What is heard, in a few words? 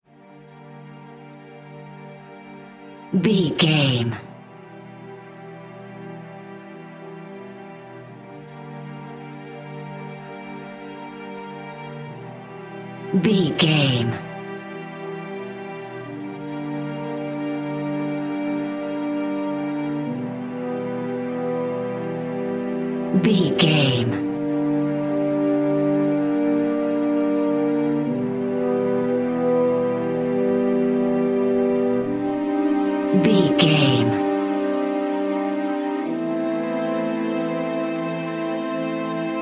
haunted forest